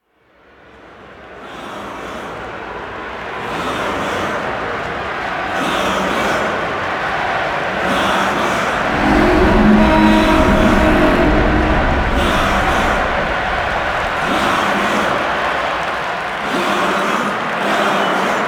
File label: Throne Room Crowd Chant; Ballas; Warframe; The New War; Narmer Outpost